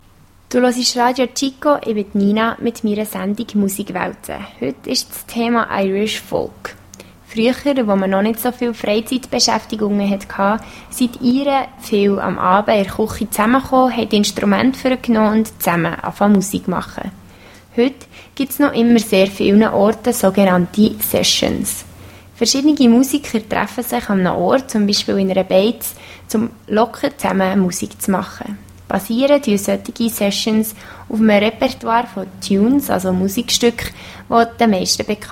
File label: Irish Folk